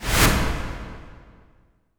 IMP WIPE, Cinematic Impact

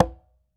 L CONGA SL, CONGA

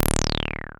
303 Short Release; Zero-G Analog To Digital 1 & 2

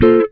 GTR CHORD; Univers Sons; Jazzistic

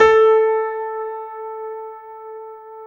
PIA MICRS, MICRO-PIANO; Sound & Vision - Gigapack II

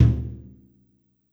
F2 Brush Tom 1, Yamaha PSR-E323 Brush Kit

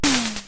Snare, Drumfire DF-500

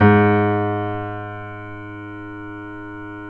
PNO MF; Synclavier Sampler Library

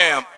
Fx Vox; Equipped Music; Loungin House

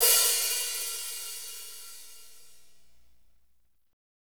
hat, Power Ballad

